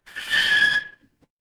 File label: mechanical tire screech